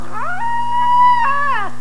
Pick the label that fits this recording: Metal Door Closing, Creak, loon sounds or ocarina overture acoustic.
loon sounds